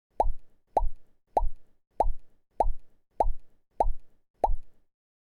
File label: walking sound